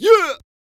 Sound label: Beatbox; neuro; Sinistarr